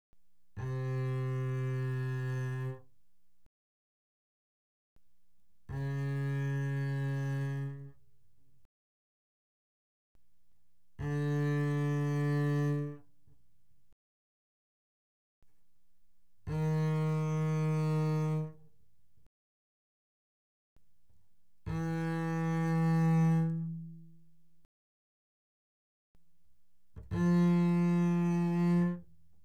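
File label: Bass arco fortissimo sul D C3 B3, doublebass; Strings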